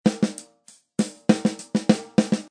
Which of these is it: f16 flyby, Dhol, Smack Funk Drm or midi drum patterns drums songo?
Smack Funk Drm